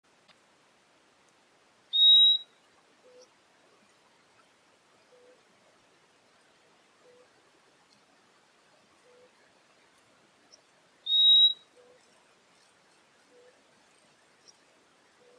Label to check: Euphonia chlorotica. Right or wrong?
Right